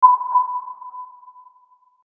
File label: Ping